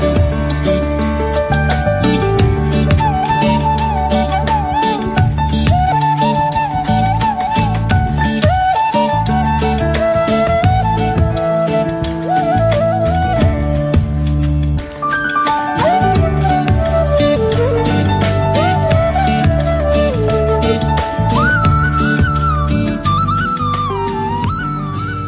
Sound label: FLUTE RINGTONE